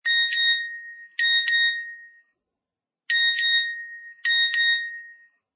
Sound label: Power On; teXet; TM-121